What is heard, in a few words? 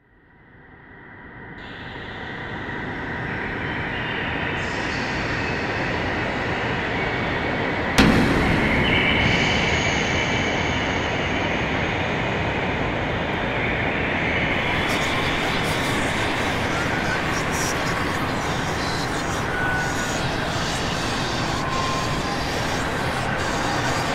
Scary forest ambience; 3 Minute Mix